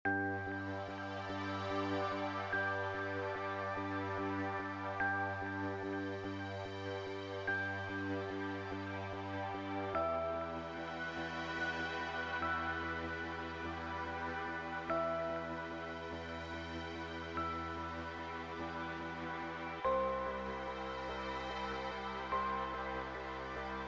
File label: tech elegant loop